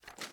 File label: reload tilt, weapons; gmod; viper